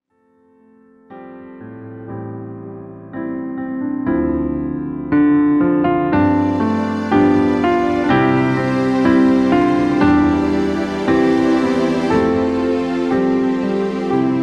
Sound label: piano and strings